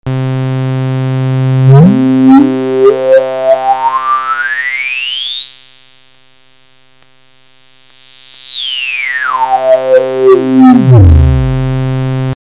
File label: hpf reso, synthesizer basics